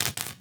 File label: Stun Baton